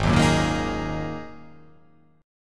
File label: G sharp 7th Suspended 2nd G sharp